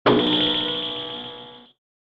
Danger Danger, DJ sounds

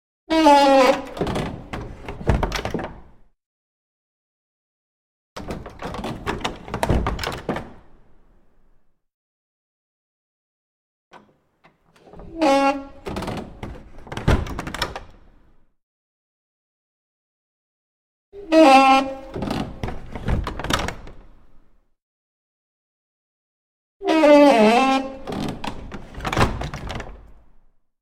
Confessional Box With Groani; Hollywood Edge Historical Series; cd4 Castles Palaces & Monasteries

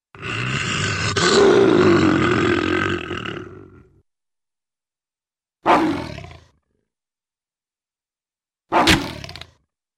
Lion Or Tiger Vicious Growl; Hollywood Edge Cartoon Trax

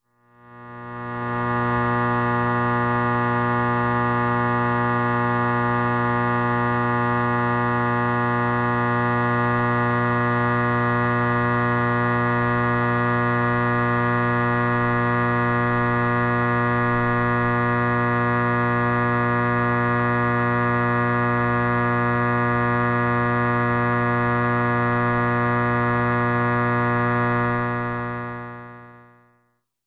Transformer Hum; SOUND EFFECTS1

